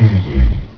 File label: Stop Machine Sound; Widget Workshop; A Mad Scientist's Laboratory (1996); Maxis